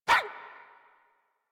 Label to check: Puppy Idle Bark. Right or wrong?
Right